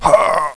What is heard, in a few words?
pain, bot; cooked